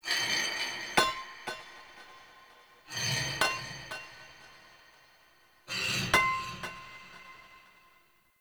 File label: Castle Misc Metal; Ambience; Midrath Island